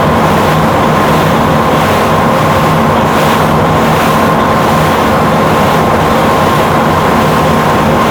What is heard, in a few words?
pontoon fast water loop, airboat; vehicles